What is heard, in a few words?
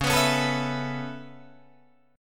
C sharp Minor 7th Sharp 5th C sharp Guitar Drop D